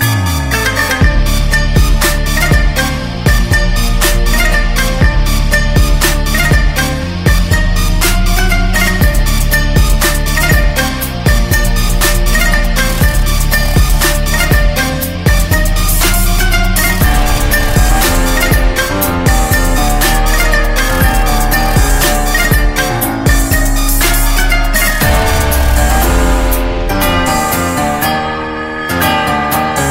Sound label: Mass Bgm Ringtone